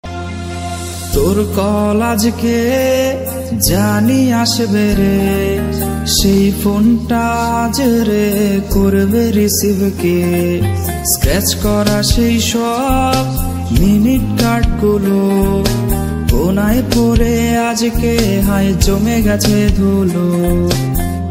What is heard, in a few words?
song, Bengali sad